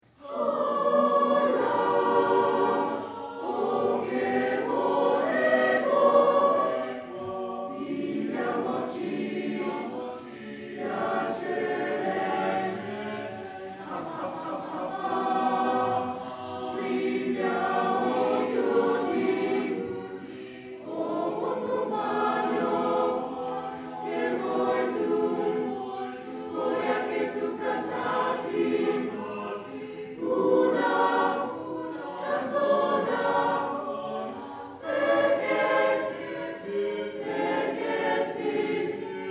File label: Echo Song, Choir; colegio maya choir